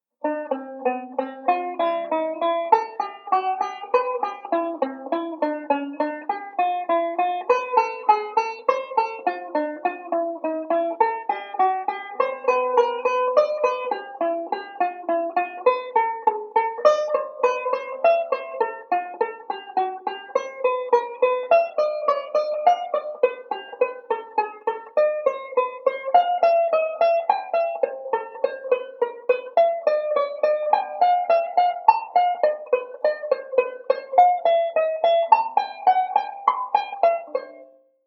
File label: Enclosure Drill slow, banjo; Beyond Chord Melody Volume II